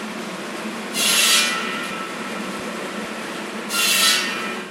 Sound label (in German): kreissaege